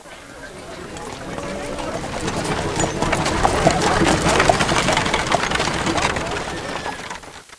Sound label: car, ambient; transportation; InventorLabs - Transportation